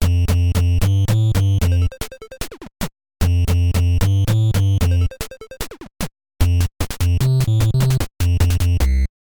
Balloon Fight Start Level; Animal Crossing

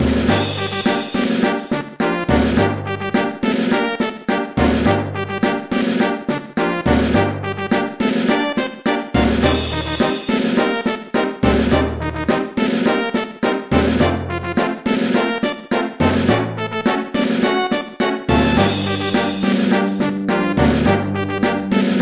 tick tick boom; TS Underswap

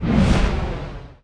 Fall; ChooChoo Heroes

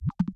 SFX hollow fish; GONNER

